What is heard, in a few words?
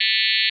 complex 220 hp